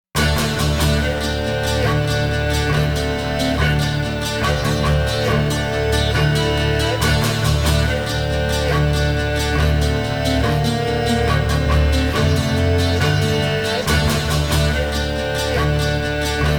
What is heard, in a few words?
Combat Loop Layer; The Witcher 3 Wild Hunt